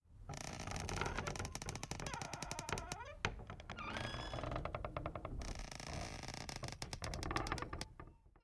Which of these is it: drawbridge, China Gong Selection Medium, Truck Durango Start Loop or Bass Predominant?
drawbridge